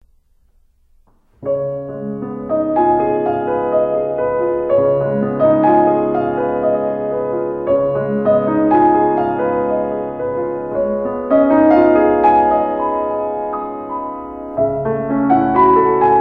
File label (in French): Oeuvres pour piano Pas trop vite; Germaine TAILLEFERRE